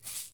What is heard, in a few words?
Salt place; Phasmophobia